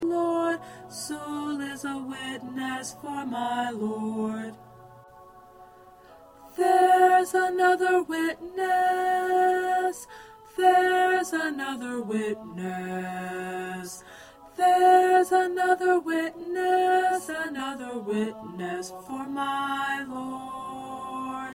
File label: P Witness 1st Tenor